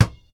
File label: Basketball